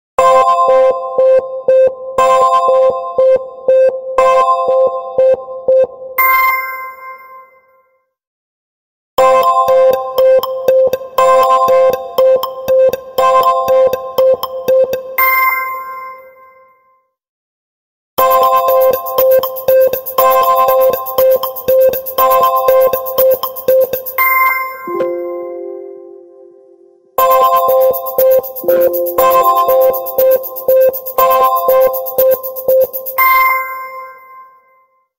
game count down

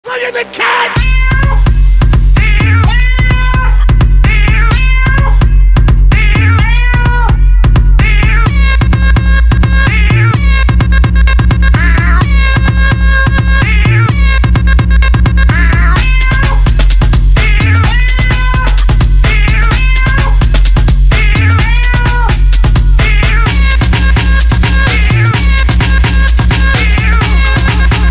Meow Remix

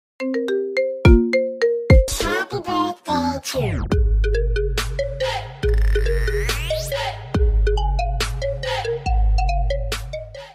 iPhone Happy Birthday

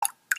Samsung Bubble

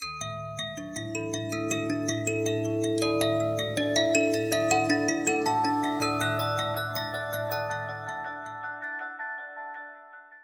Vivo X80

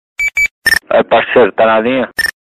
Nextel

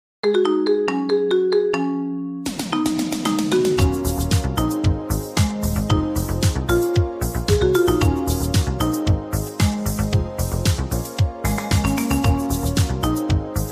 Marimba

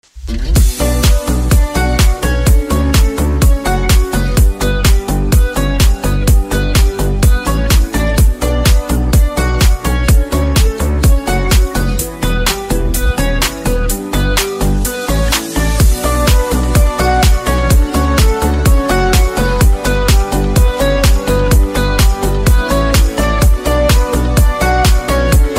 Instrumental

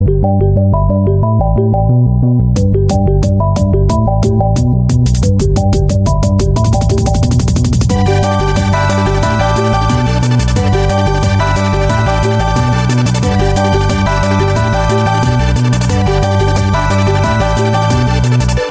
iPhone 11 remix

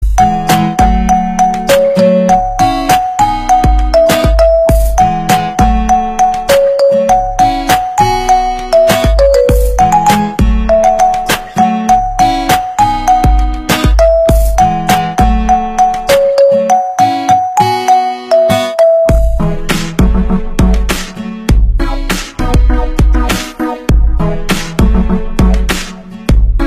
iPhone Marimba

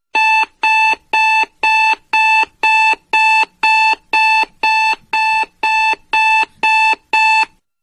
Alarma Samsung Galaxy S22

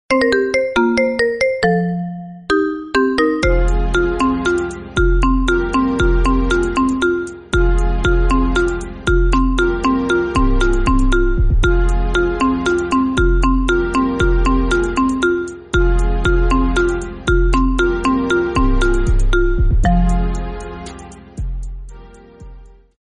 Superhero Marimba

